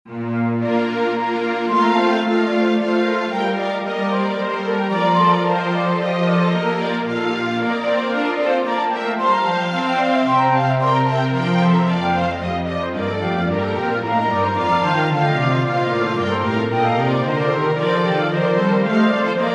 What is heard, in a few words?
Instrumental; Bach; St John Passion